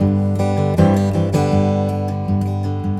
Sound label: A GUIT G MAJ, 80BPM; Best Service; World Colours